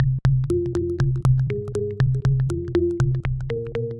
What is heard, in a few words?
Modern Synth, Synth Textures; Sonic Foundry (Sony Creative Software); The Electronic Music Manuscript Collection